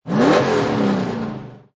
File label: Rev Short; King; Cars